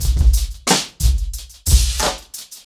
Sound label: 90BPM, acoustic drums; The Roots Drumloops